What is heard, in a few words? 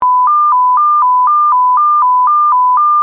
fsk